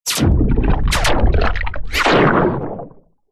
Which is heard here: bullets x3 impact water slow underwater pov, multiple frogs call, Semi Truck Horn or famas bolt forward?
bullets x3 impact water slow underwater pov